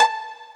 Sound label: Pizzicato; osu